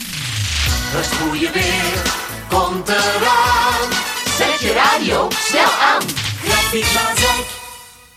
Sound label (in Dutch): Jingle lente; Gezongen lentejingle. Samenvatting: lente komt er aan.; Grafiek